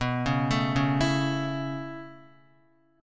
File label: B 7th Suspended 2nd Suspended 4th B down Guitar; B7sus2sus4; arpeggiated; JGuitar